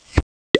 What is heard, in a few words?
chtoing